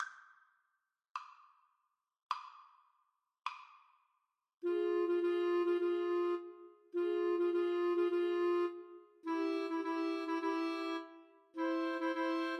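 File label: summer 2nd movement four seasons clarinet trio karaoke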